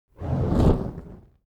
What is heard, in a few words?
elements fire whoosh torch med flange; smartsoundfx